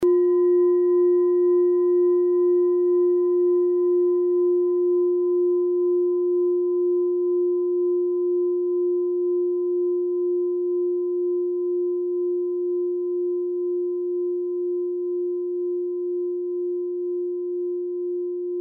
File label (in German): klangschale bengalen